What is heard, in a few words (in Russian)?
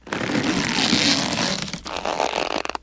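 ras, zvuk rastiagivaniia, stretching sound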